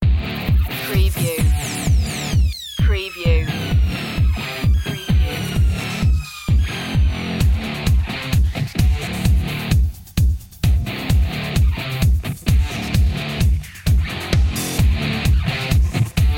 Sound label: 80s revenge